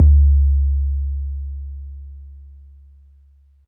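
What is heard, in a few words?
bass drum 808 MPC60 Smooth C#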